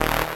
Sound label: dirty sequence; instrumente